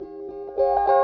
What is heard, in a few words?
Guitar Wah Loop; Digital Sound Factory Xtreme Lead